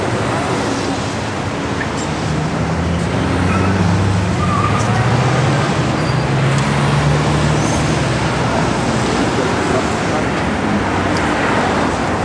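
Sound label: background music; Virtual Graceland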